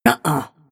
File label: deny pain; snapfire